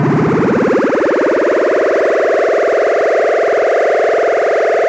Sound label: accel, VIDEO GAMES